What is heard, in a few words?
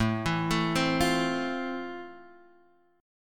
F Major 7th A